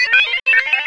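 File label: sphere blips large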